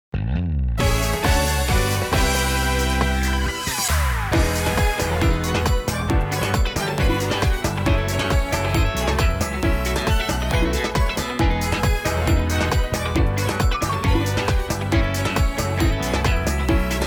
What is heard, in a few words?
inst